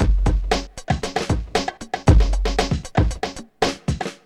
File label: bongo 116; Zero-G; Funky Elements